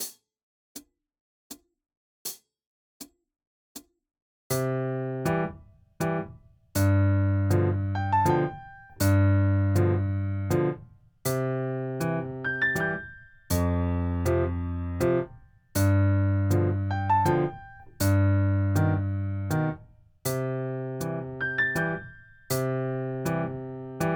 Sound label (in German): 4 Toene Begl.